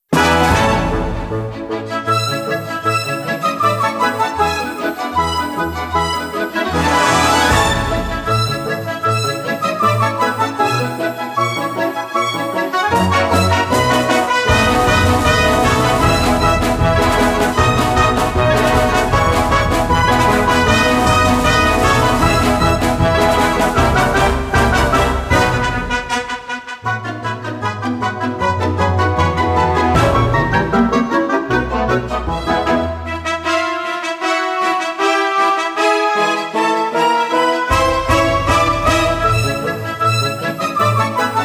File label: Tritsch Tratsch Polka; Taiko no Tatsujin Atsumete Tomodachi Daisakusen 2015; Wii U